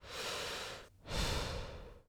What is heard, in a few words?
Human Male Inhales And Exhales